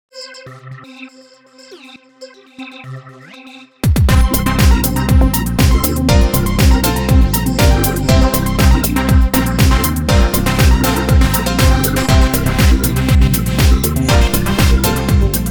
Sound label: Techno